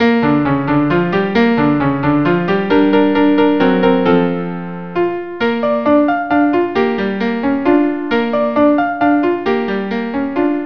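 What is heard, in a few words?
violin viola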